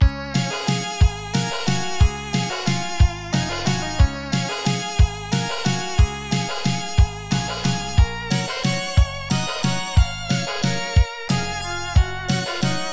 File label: Loading BGM; Silk Road 2; x68000